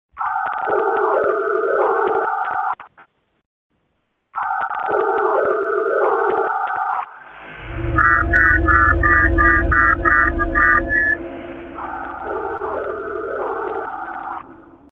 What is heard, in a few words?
phone audio; Fortnite